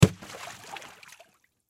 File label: Water Splash Ball Drop In Pool Toy; Backgrounds; Blastwave